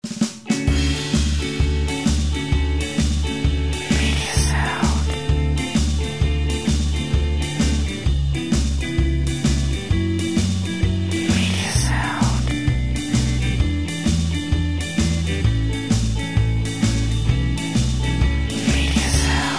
Swing Kong - 130BPM, lounge swing

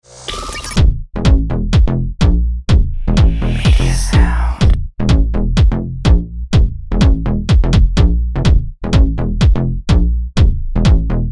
House Dance

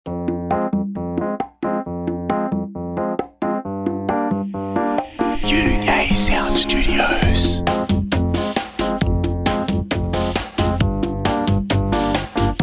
Jazzy Vibes, Chill Out

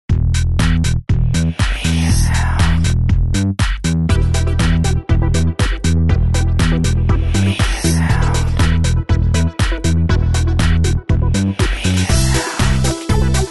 Lush - 120BPM, House - Dance

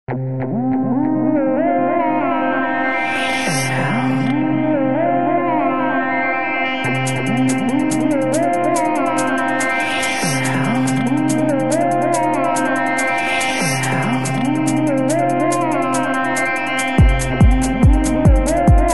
House Dance 142BPM

